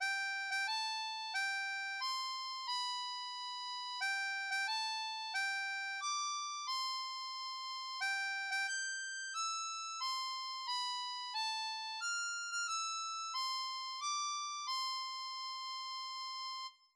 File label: Joyeux anniversaire, harmonica; Do; Diatonique